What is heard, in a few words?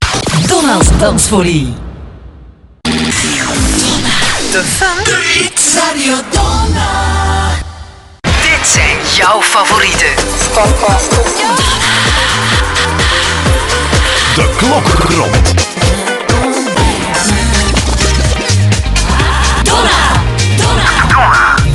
jingles mono